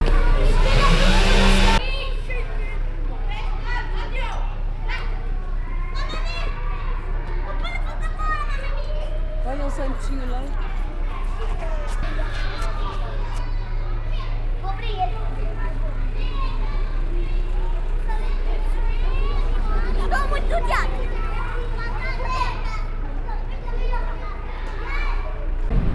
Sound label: SP Edificio Italia, Brasilien